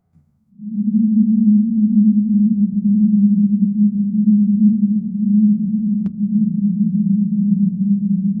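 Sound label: dreamy hum whispers distant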